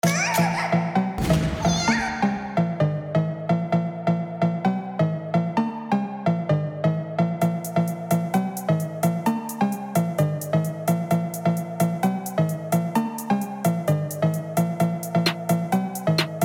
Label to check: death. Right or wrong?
Wrong